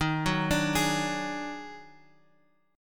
Eb Minor Major 7th Double Flat 5th, down, Guitar, Open G